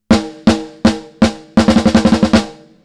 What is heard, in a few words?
snare Funk Snare; Joe Chiccarelli